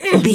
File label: voice female attack